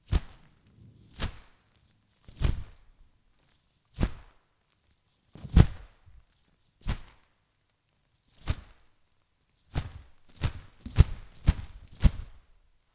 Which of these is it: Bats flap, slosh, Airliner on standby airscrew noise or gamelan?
Bats flap